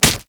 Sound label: Melee Fist Impact Generic; Fists